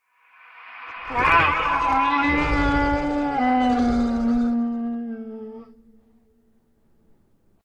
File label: Hounds Roar; Hounds of Kintargo; SyrinScape SoundsScape Collection; bbh Haunting part 1; TTRPG